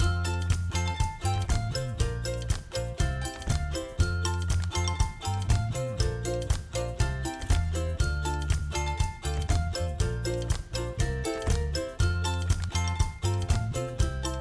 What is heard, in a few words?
music cheerful; bgm